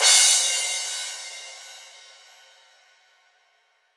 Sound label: CRASH, One Shots; DEANS ZLIDJIAN; M8; Electronisounds; DnB Rebellion One Shots